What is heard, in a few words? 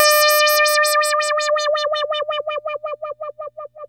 2600 D5, ARP 2600; Hollywood Edge - Giorgio Moroder Rare Synthesizer Collection